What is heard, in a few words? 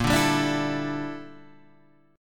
G Minor 6th Add 9th A sharp